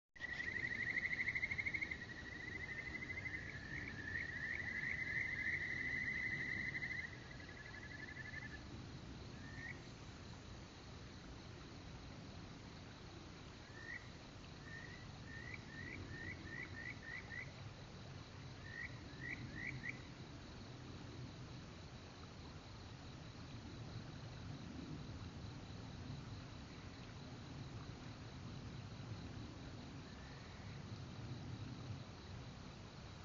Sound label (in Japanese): kajika gaeru nakigoe